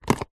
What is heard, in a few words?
foley plastic lunch food pot remove from inside another; zapsplat